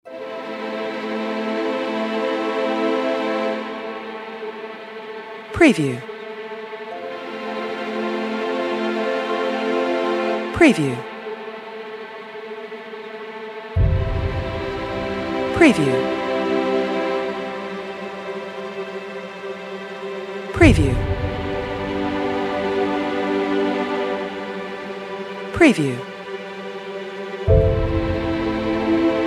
Emotional Sad Epic Powerful Female Voice Opera Vocal